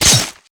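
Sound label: Battle Spear; Etrian Odyssey III HD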